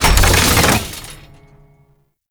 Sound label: UI open; Veilbreaker; Kahl Customization